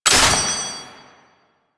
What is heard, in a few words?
Sniper Last Shot; DE; Warframe; Warframes; Frumentarius; ExaltedSniper